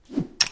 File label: tiles match; papersiomania